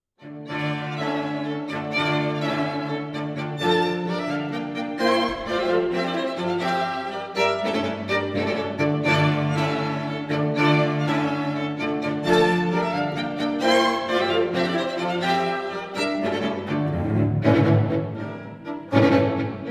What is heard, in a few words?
string quartet